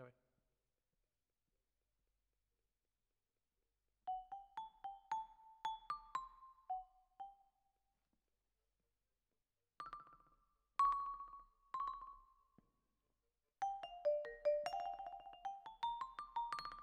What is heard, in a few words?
Vibra Close